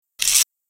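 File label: Mechanism Fast Metal, servos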